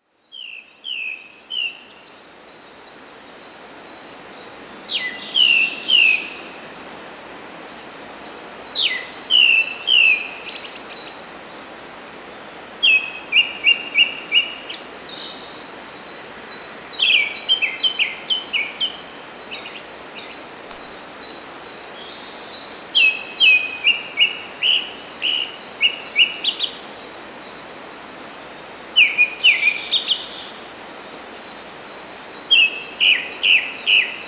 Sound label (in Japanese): kurotugumi, bird